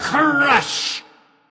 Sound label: attack crush; ultrakill minos prime